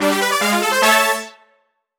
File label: Toy Baseball Hit; Switch 2